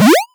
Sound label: Jump